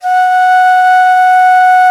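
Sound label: alto bansuri, World Winds; E-MU Producer Series Vol. 7 – Old World Instruments; World Combos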